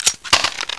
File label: clip out; brainbread